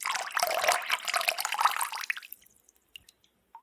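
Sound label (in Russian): вода поток мягкий спокойный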